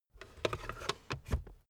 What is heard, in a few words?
car backseat table fold in slow